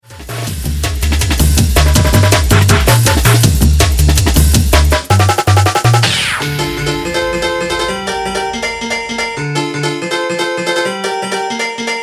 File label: Piano